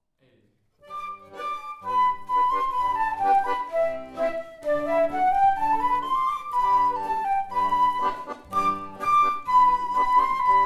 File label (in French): Scottish débouchée